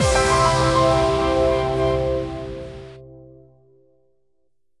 Jingle Building level up

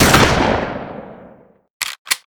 Shotgun

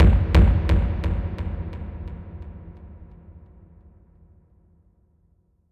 Start Game; The Other Side; Windows

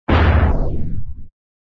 attack missile; Monster Arena

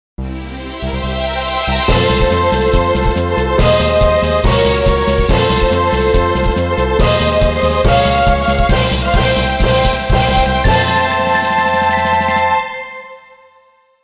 All Clear

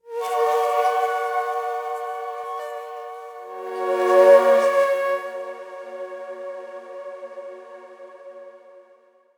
jingle

